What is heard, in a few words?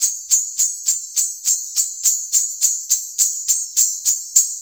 Bellshake, Rattles, Shakers; Sonic Foundry (Sony Creative Software); World Pop; Percussion; Tambourines